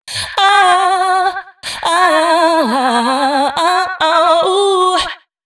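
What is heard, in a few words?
female c# 140 bpm, Dance Ultra Voice, adlibs; Mutekki Media; Dance Ultra Voice VOL-1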